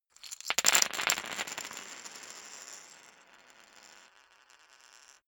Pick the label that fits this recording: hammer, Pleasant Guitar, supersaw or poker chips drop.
poker chips drop